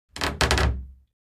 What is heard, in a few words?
Creak